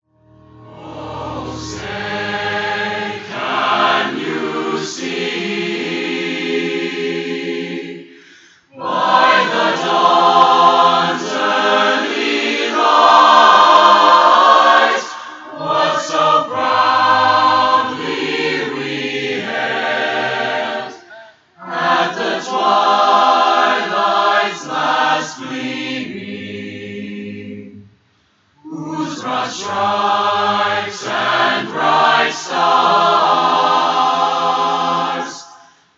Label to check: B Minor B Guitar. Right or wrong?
Wrong